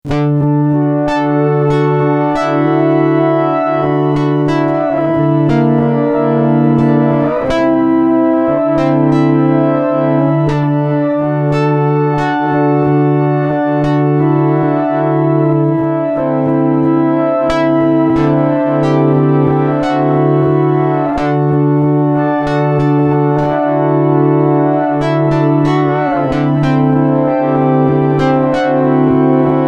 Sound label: Preset Vibe Synth